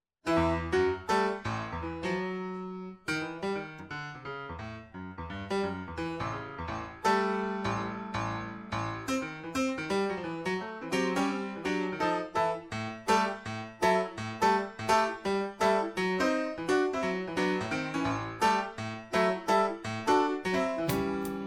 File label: Piano Sections